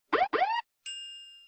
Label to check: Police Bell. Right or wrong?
Right